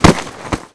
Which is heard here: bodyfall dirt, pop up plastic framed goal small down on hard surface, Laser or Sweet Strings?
bodyfall dirt